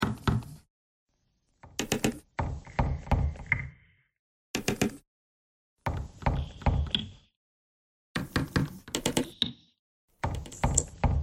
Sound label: bagpipe thread noise